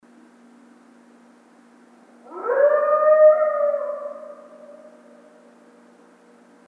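voi odinochnii dolgii volchii priglushennii, single long muffled wolf howl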